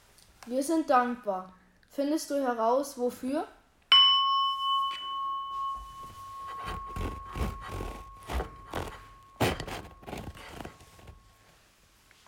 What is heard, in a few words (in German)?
Akkordeon; Hörrätsel Erntedank